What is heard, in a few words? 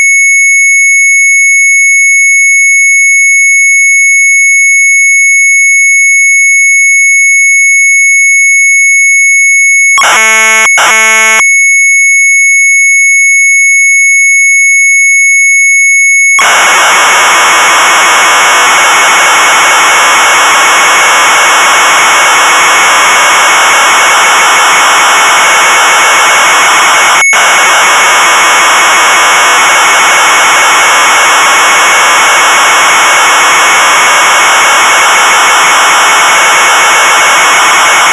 MZ800 Tape, sharp; TEKSTVERWERKER; retromelsarchive